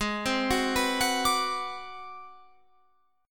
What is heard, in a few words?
G sharp 7th Sharp 9th Flat 5th G sharp down Guitar Open F, chord; G#7#9b5; arpeggiated; JGuitar